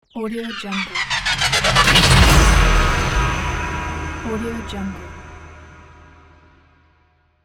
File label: east asian trailer sound effect